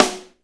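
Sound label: snare drum, acoustic drum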